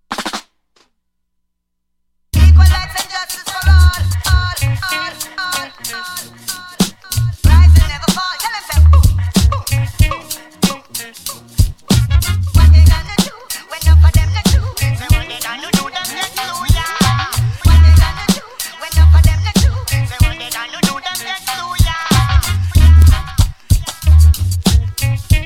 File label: beat